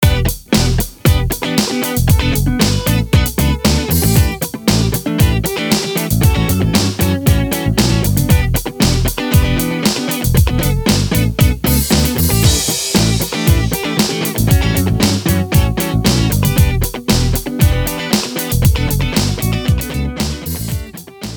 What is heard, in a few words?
bass